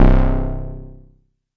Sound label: mbrute syn, Arturia MiniBrute